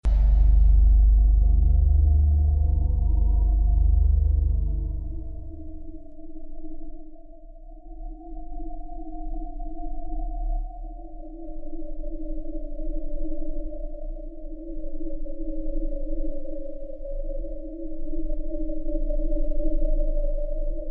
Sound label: music; svencoop; sence; mistake coop